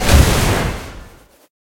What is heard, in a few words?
missile switch, weapons turret; Quake 4 Alpha